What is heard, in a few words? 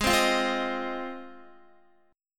G sharp 6th G sharp down Guitar, chord; strummed